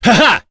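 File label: Vox Attack, English; Nicx; kings raid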